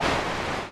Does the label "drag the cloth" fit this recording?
Yes